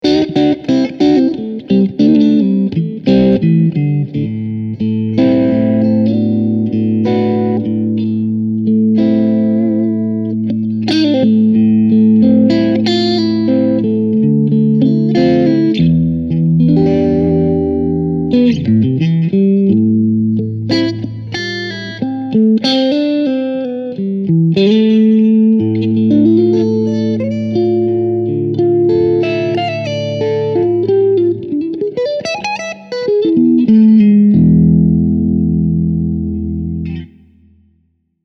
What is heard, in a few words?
Bluesy